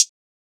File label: closed hihat, drum one shots; Cymbals; Hihats - Closed; Cymatics; Cobra Hip Hop Sample Pack